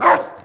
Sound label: pitbull bark; animals; Tony Hawk's Project 8; XBOX